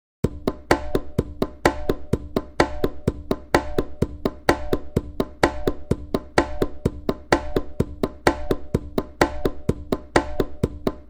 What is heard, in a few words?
Congas